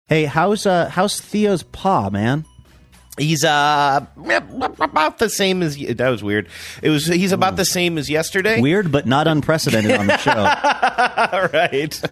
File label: making marsupial gurgle like sound in context